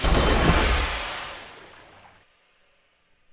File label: Ironclad Run End; Civ4 warlords